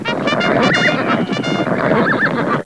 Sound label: CHARGE; dragoon